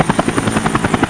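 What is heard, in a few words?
helicopter; Barrage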